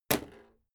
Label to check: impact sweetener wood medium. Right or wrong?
Right